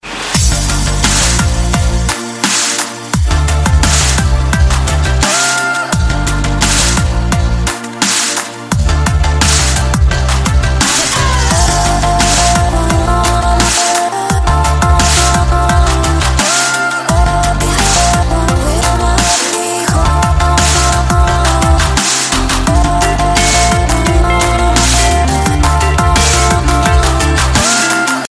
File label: chillstep